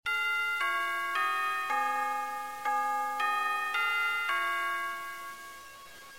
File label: Big Ben